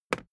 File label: briefcase plastic handle bounce; industrie wirtschaft; bueroarbeit